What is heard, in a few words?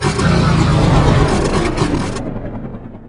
behemoth charge winddown; bigheaded behemoth